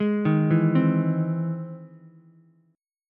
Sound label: E Augmented Major 7th E down Ukulele; arpeggiated; E+M7; JGuitar